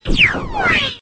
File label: PROP ARMOUR PIERCING